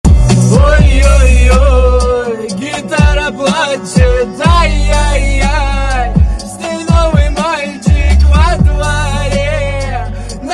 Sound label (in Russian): gitara plachet